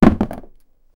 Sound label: physical